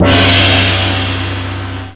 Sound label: Gong; Gong after sword-training; Zelda